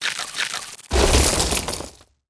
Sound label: King Crab Skill; Seer; GamigoNA